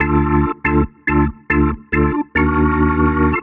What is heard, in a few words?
Jazz Keys Organ 140 D, Chordy Loops, 140bpm